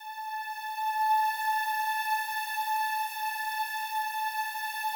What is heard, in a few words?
slow strings A4, Yamaha VP-1; 90 s Sample CDs; Music Store